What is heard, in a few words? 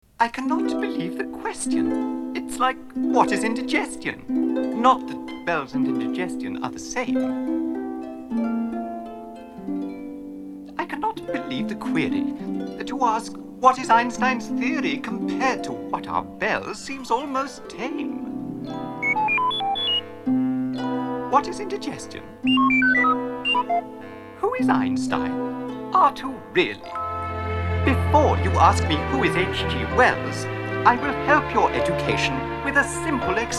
Bells Bells Bells